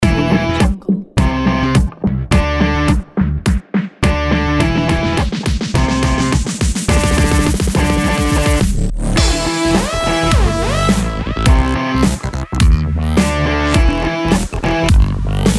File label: future funk